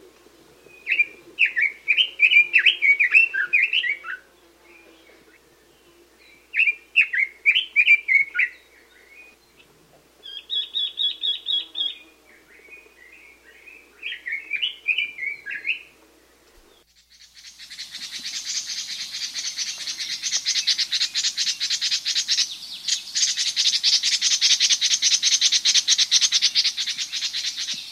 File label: Red-Billed Leiothrix